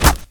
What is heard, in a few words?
flesh, bullets impact; hl2